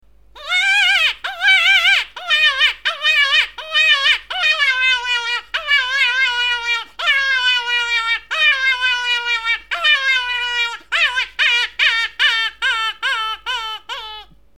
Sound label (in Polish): wabienie calosc